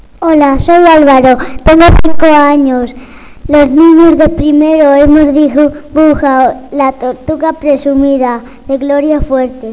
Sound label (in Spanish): gloria voz